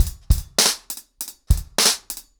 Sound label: 100BPM, ACOUSTIC DRUMS